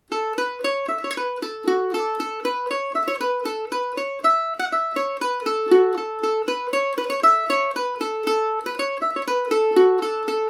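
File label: A major paris swing, irish mandolin; teahans favourite; Paris Swing “Macaferri” mandolin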